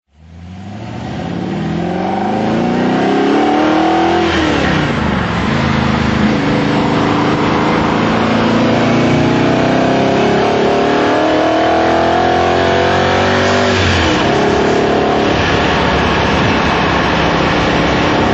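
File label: morgan plus8 drive, engine sound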